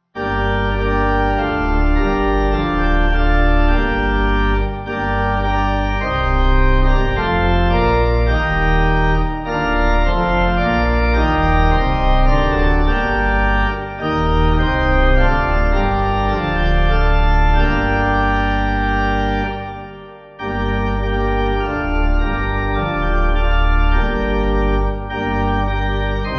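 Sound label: Saviour Of The Nations Nun Komm Pipe, Pipe Organ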